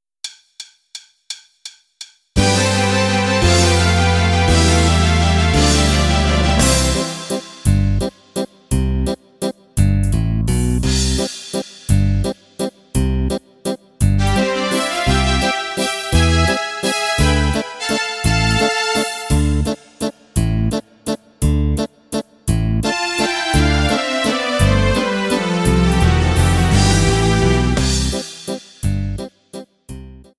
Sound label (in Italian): base, valzer viennese